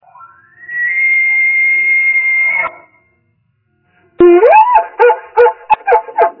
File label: bugle, bowhunting